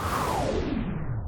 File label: suck end; World Of Goo